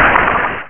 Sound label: end of explosion; Volcano Stack